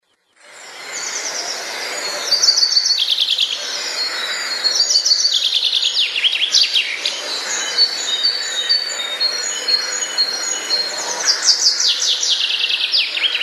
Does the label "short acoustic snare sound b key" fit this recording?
No